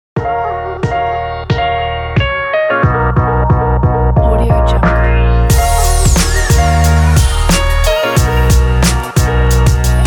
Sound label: upbeat boom bap hiphop; avant-beats